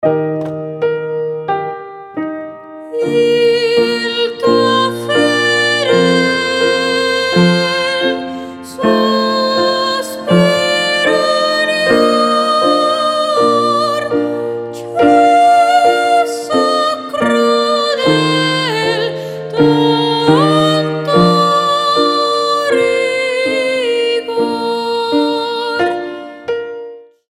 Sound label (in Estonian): laul